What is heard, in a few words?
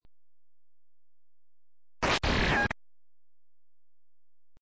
beam